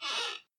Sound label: rocking chair; Devour